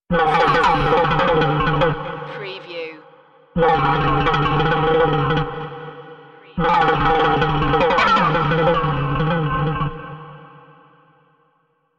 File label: Evil Marble